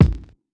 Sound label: kick, house